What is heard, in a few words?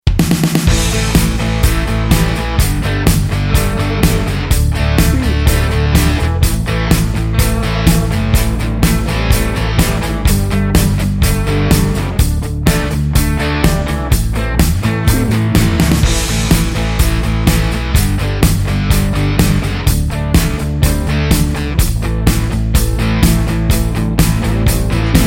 texas rock bed